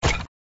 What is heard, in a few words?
blocker hit; gardenbloom